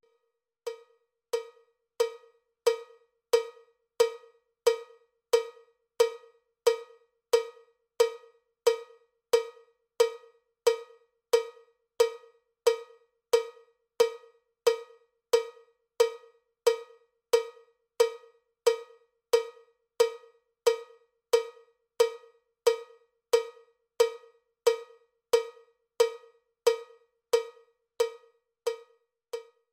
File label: cowbell click track bpm 79; cowbell click track bpm 90